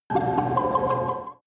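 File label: extrinsic vocals, energy; energie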